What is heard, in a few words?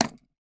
household pocket watch put down on table